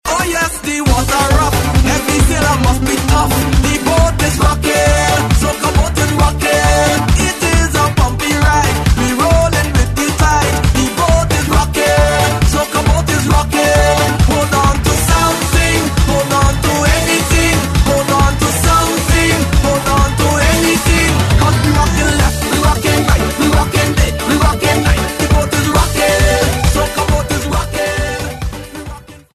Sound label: Boat Ride Red Plastic Bag